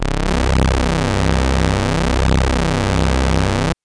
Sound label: Synth Pulse Pad c0; TrashLibrary